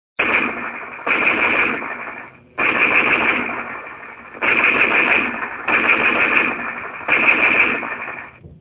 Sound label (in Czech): strelba, zvukové moduly; krick; zvukovy modul strelba iww; lode